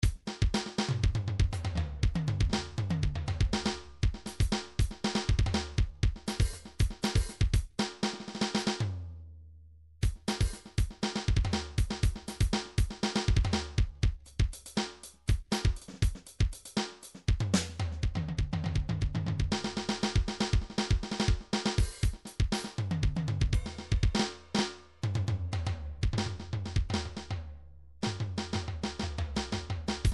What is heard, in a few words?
midi drums percussion fill; midi vol 4 drums percussion